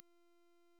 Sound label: Overcharged End; Warframe; Warframes; Gyre